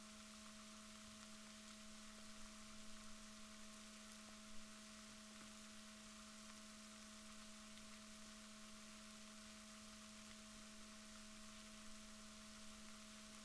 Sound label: rorquals negatif, Balaenoptera Physalus Chile